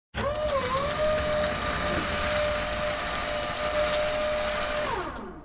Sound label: Electric roller shutter close perspective; blinds